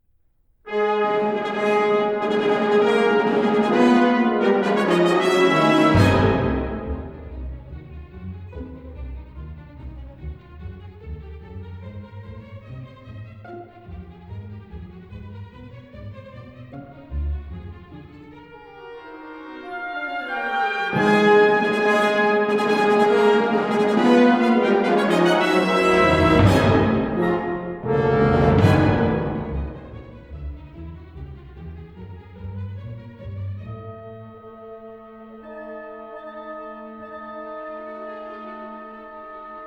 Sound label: prelude